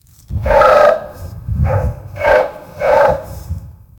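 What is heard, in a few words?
giant cerberus dog growling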